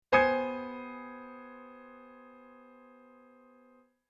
piano resynthesis, Piano re-synthesis of the source spectrum; metal plate source sound; percussive sinusoidal re-synthesis; Spectralism and Microsound